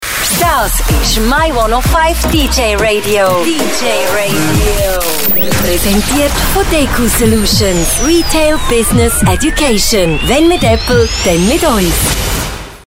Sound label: Jingle; DQSolutions